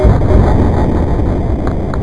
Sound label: enter; betweenworld